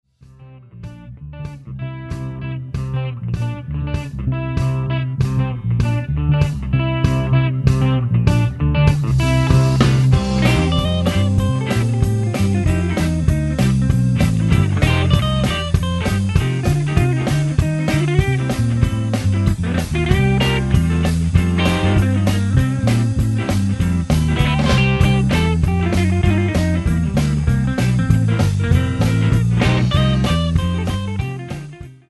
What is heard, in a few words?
Swing